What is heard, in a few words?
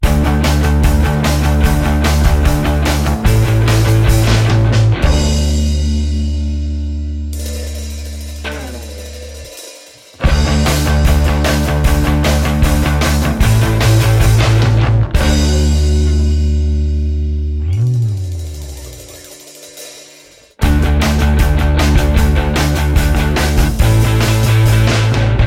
instrumental